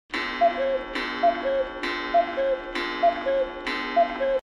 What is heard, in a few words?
cucu cucu cucu ringtone; ton de apel